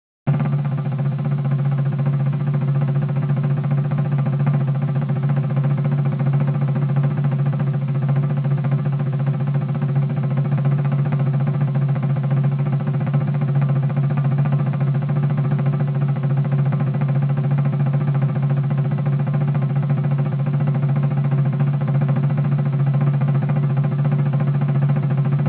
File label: taps with drum